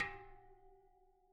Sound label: Bicycle Spoke